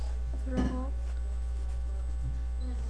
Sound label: frog